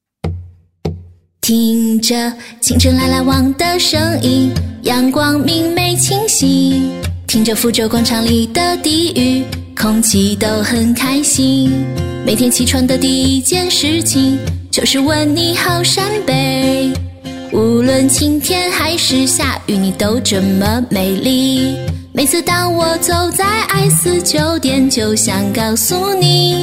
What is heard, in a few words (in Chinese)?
歌曲翻唱